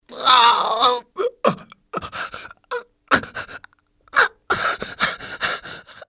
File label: writhe, pain death, male